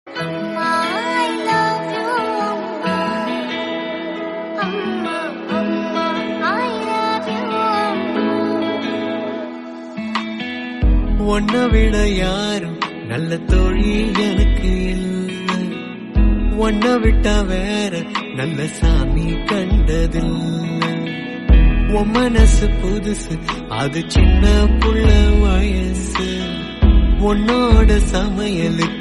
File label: amma i love you lovely tone ringtone